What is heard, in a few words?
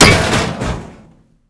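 destruction cigarette tray; Explosions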